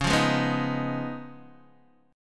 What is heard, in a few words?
Db 9th Db down Guitar DADGAD; strummed; JGuitar